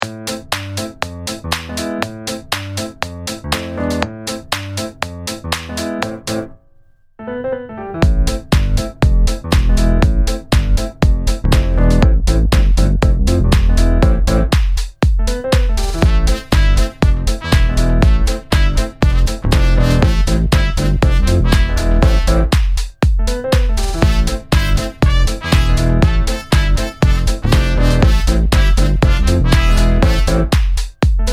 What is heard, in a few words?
EDM Electro Swing